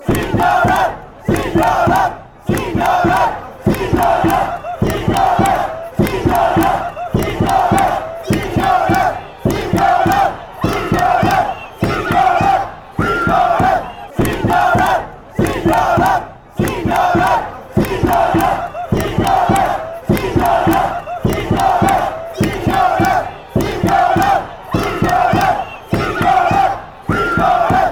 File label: no crying, fanchants